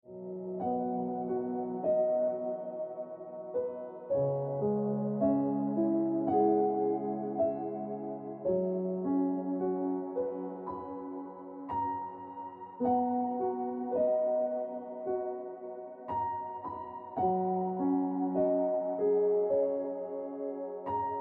Piano Music

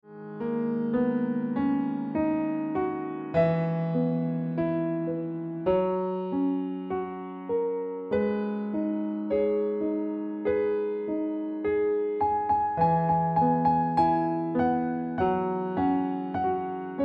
Ringtone Piano Music

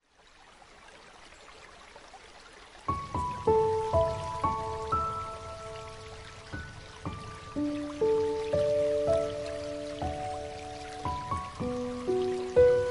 Piano Music

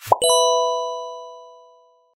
Pop Notification Sound; ringtone

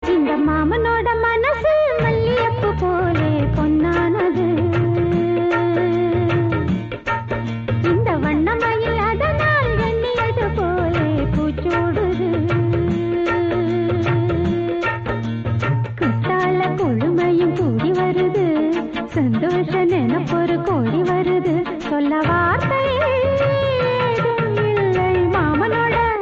Tamil Song; ringtone

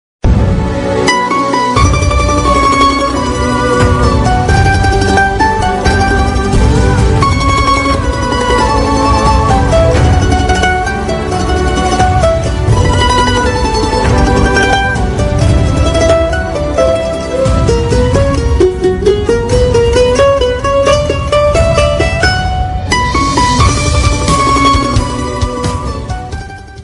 Instrumental Romantic Hindi

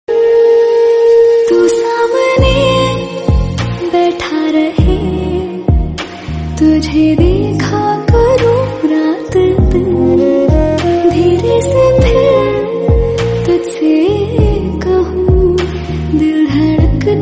Female Version Hindi Song

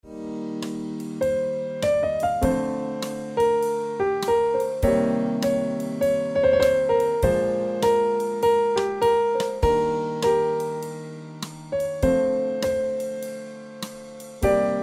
Piano Music

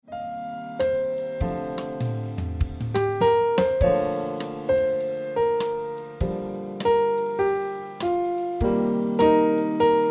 Piano Music

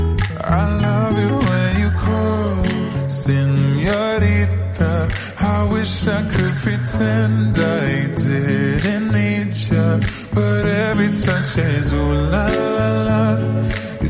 English Song

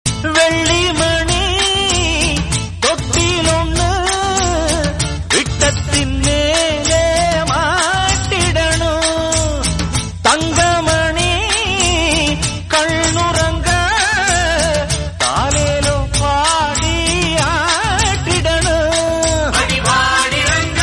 Tamil Song Ringtone

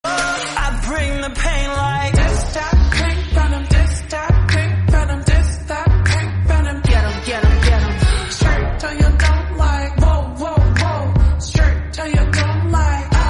Slowed Reverb Korean Song

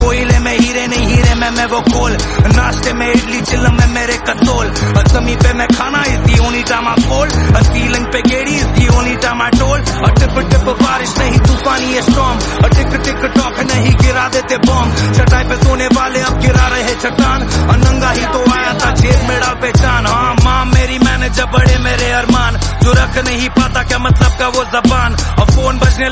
Hindi Rap; ringtone

